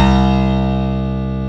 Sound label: PIANO C1; Big Fish Audio; Synth City